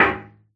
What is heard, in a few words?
window knock; scripted sequences